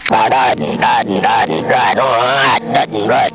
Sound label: belch